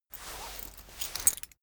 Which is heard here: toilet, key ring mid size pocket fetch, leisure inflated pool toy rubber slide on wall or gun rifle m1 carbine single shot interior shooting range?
key ring mid size pocket fetch